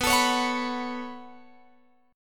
B 7th Suspended 2nd, Guitar, Open F; B7sus2; strummed

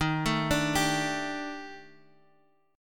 Eb Major 7th Flat 5th Eb Guitar Half Step Down; EbM7b5

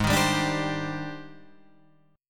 G sharp Major Sharp 11th, chord

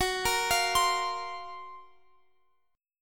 F sharp Major Sharp 11th F sharp, Guitar Open F; F#M#11 arpeggiated; x,x,18,17,20,19; chord shape

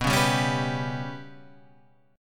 B Suspended 2nd Sharp 5th

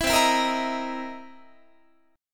C 7th Sharp 9th C Guitar; C7#9 strummed; 19,20,19,20,19,21

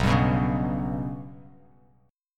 B Suspended 2nd Sharp 5th B down, Bass Standard; Bsus2#5 strummed; JGuitar

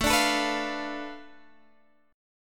B Minor 7th Flat 5th A

